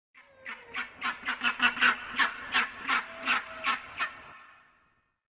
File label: creature; pikobis; Star Wars Jedi Knight II Jedi Outcast; XBOX; ambience; yavin